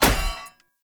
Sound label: Polearm Medium Impact Default, Weapon Impacts; Player; Environment; Sphere Polearm